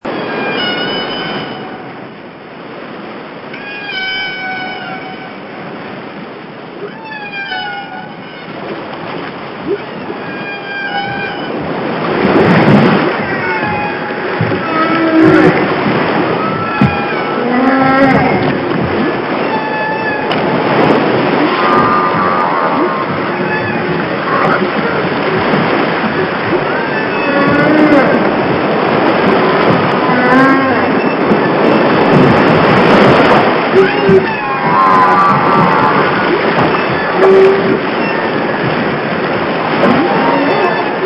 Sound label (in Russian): Gorbatyy kit Nizkochastotnyy krik gorbatogo kita samyy gromkiy zvuk izdannyy zhivym susche